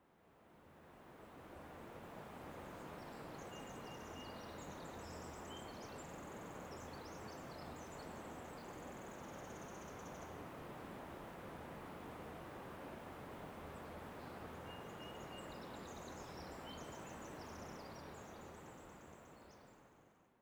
river atmo

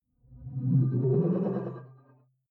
sci-fi display transmission damped

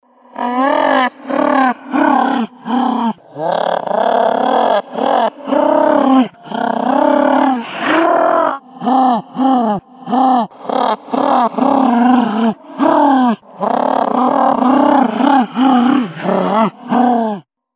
creature

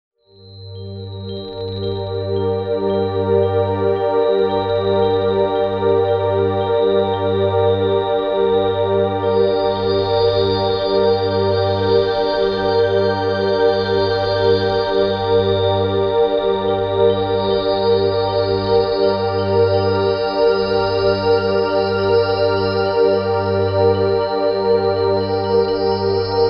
wide galaxy pad

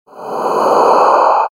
move swosh breath

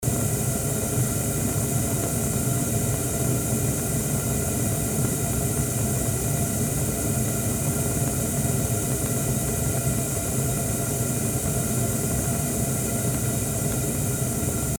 gas streams warm sharp

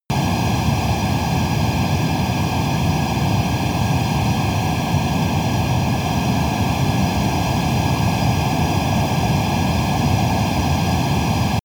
military jet fighter turbine steady soft

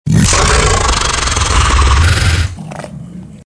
danger growl

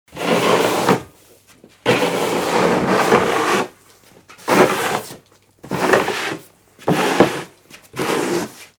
shiping snow

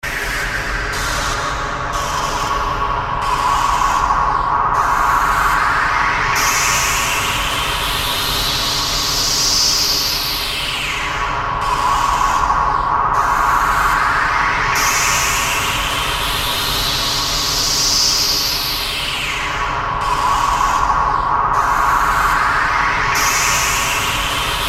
big room noisy pulses long

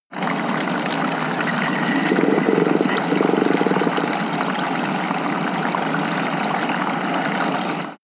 drain bubble

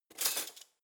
cutlery spoons drawer grab

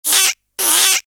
ducky monster

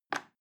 plastic button little release regular

